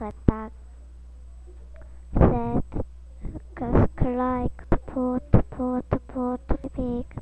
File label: cry